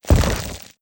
swarm station explosion small; weapons; Stellaris

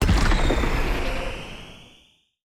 action activation astral boost; astral planes

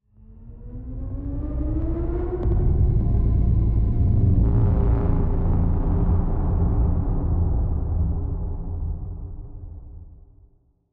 psi ship colossus move; ships; Stellaris; shroud

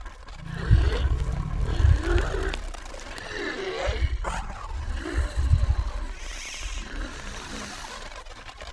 dimensional horror idle; Stellaris; guardians

